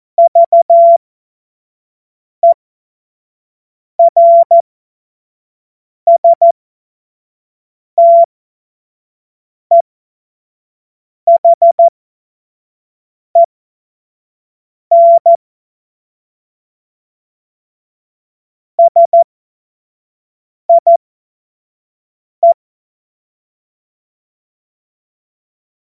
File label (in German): Witz, Morsekurs, 25 F70; Witze